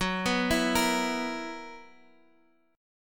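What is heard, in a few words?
B Major 7th Suspended 4th, G flat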